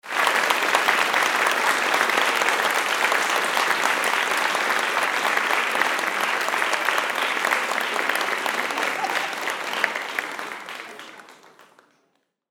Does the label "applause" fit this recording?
Yes